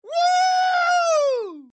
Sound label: Correct Answer; spongebob; nickmaster